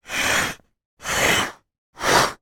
game plastic letter tile rack slide around board 3 times; scrabble